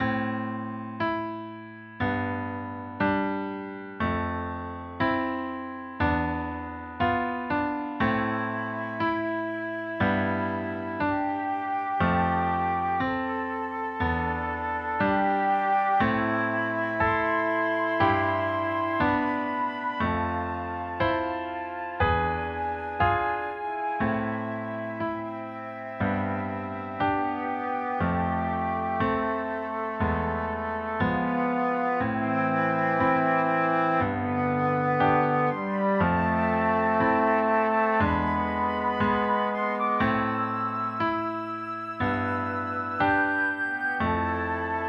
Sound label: Flute